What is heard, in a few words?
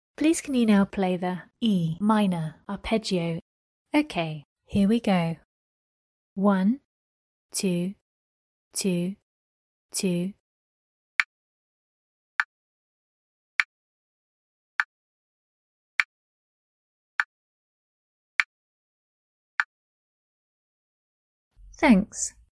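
E minor arpeggio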